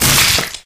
squished by crusher; player; chell

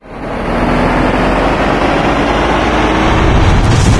stickybomblauncher charge up, weapons; tf

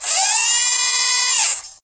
sentry move medium, weapons; tf

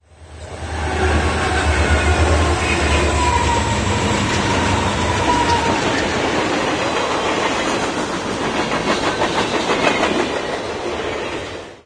train pass, ambient machines; Source Engine; cstrike

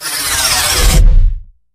elevator tube close

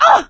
hurt major; source engine; left 4 dead 2; producer

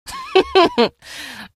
laugh; episodic; episode 1; Source engine

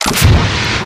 rocket pack boosters charge; tf

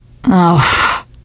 negative noise, teen girl, survivor voice; Left 4 Dead; Source Engine

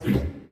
editor extrude; Source Engine; Portal 2

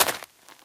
gravel, player footsteps survivor walk; source engine; left 4 dead 2

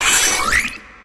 sphere rail start

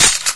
aug bolt slap, weapons; Source Engine; cstrike

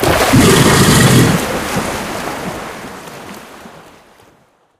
crocs swim burst; Source Engine; tf; lair

